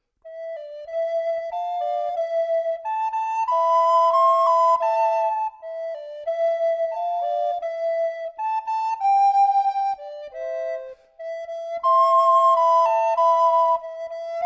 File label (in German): Sopran Alt Solo